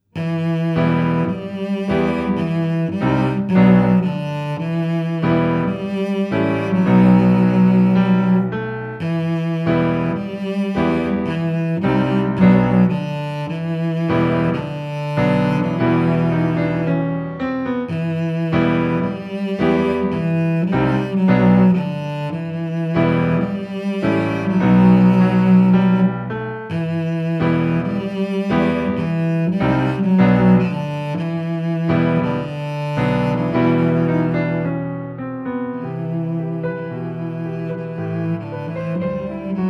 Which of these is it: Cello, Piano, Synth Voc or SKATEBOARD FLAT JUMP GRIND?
Cello